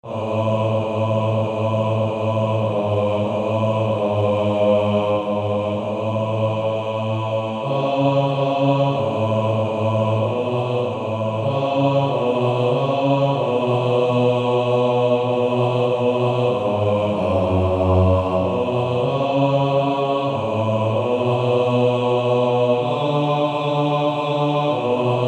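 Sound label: Male Choir, bass 1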